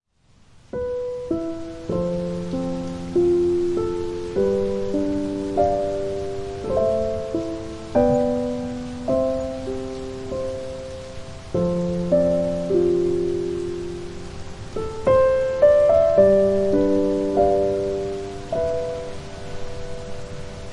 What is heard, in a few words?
Piano & Rain